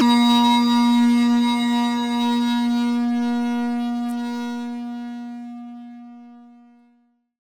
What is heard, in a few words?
blip